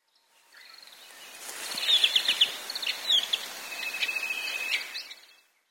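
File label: Asian short-toed lark, sakata; himekotenshi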